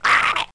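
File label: skeleton hit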